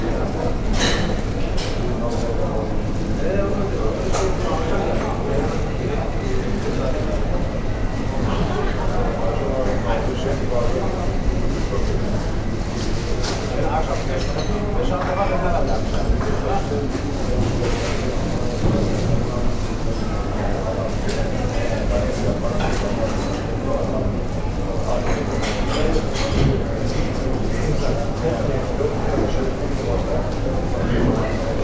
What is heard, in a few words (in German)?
einkaufszentrum xy; roland r-26